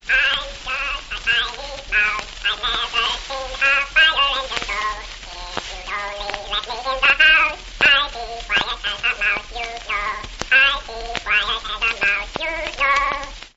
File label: mouse song, Voice